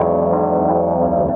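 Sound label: KEYS loop R, Drum & Bass 175 BPM; Ueberschall; Drum & Bass Flash Trackz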